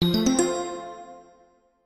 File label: level up; keezers